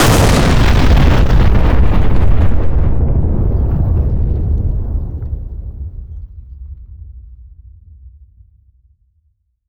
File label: Explosions Explode Ground; DCS